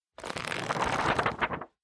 Book Flick Through

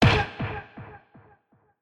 Hit

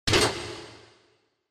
Spotlight

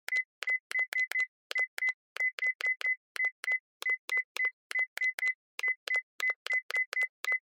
Cell Phone Hardware Button Beep

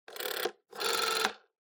Rotary Phone Hardware, Dial

